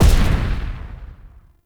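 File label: Exalted Archwing Scythe Projectile Die; Weapons; Melee; Warframe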